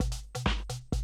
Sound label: 130, Classic DrumLoops